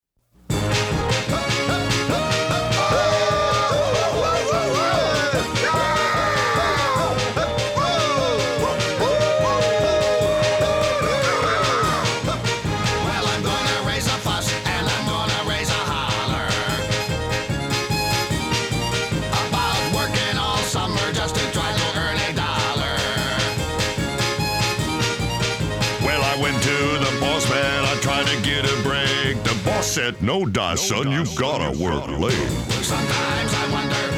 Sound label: Polka